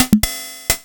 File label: Yamaha PSR